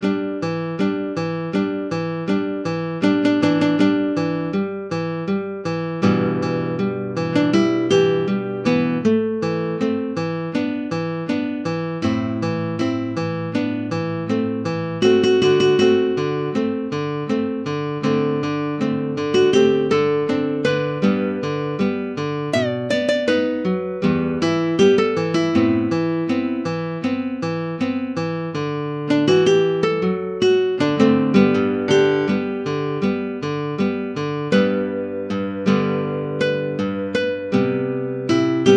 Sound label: synth